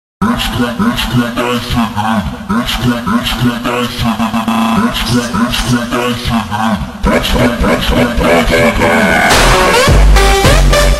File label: Funk Slowed Reverb